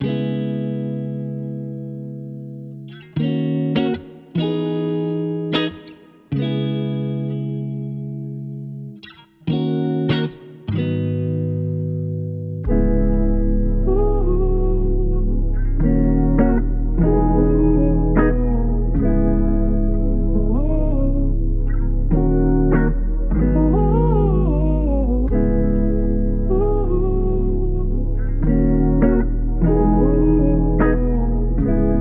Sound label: 152 BPM B Maj, Melody; Cymatics; 2022 Melody Collection Platinum Expansion